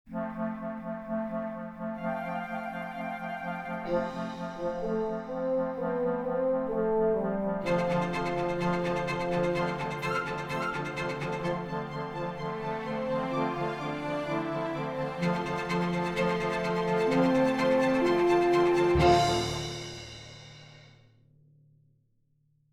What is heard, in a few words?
Music Trailer; Erasmus; erasmus experience